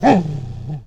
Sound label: Wolf, alerted